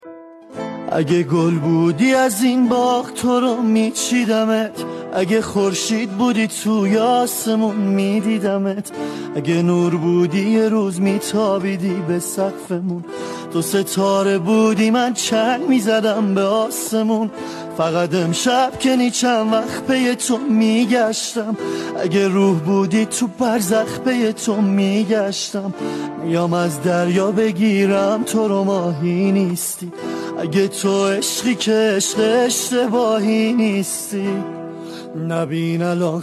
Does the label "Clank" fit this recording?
No